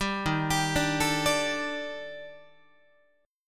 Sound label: Eb Major 7th Flat 5th, Guitar; EbM7b5; arpeggiated; JGuitar